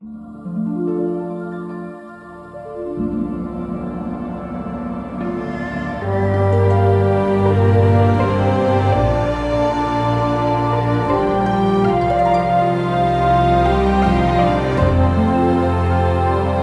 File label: Memory Positive Start